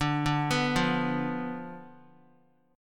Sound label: D Minor 6th Guitar; Dm6 arpeggiated; x,5,2,4,5,x